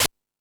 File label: House Machine, Drum Machines